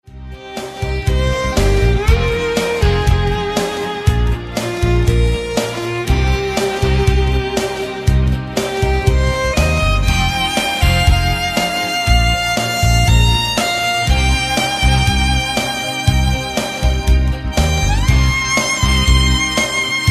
violin

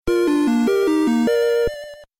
level up; Zelda

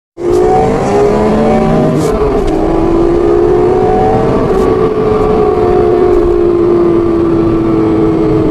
Bugatti speed

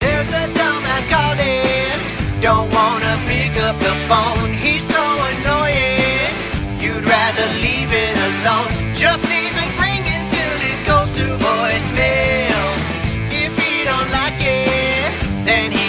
annoying funky rock ringtone; Funny

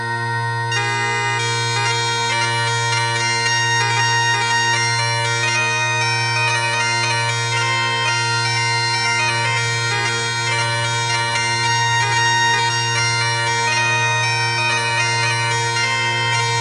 bagpipes sound; Electronica

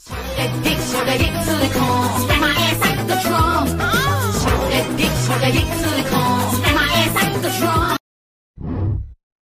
ringtone

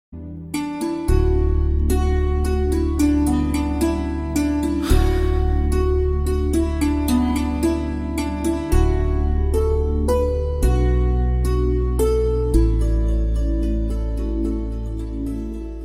instrumental; Thattathin Marayathu; Indian

